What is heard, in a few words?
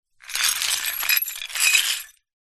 broken beer bottle wet sweep brush